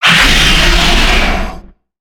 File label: Dragon Roar; creatures organic